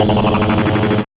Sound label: Noise, 8-bit, Bitty Noises; musicradar; 8-bit bonanza